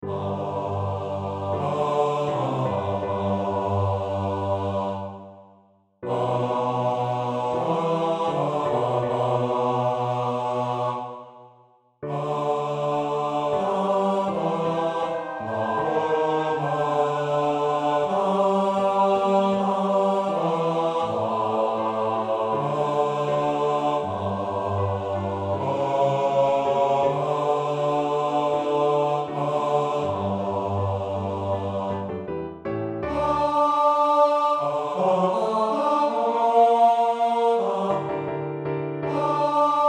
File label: Bass; Sanctus